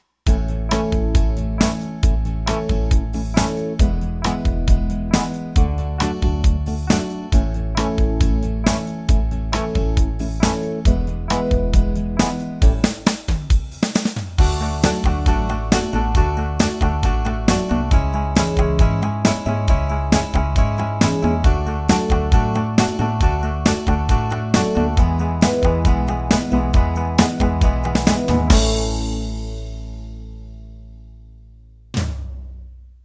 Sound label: 8beat rock